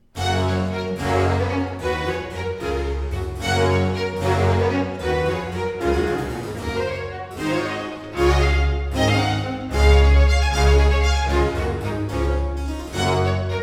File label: Concerto (Sinfonia) in G major for strings and harpsichord Presto; RV 146; A. Vivaldi; Concertos and Sinfonias; 尼古拉斯 · 麦克吉根